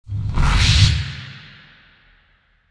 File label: big zap; Dystopia; injection